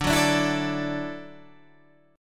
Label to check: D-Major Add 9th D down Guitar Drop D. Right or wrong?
Right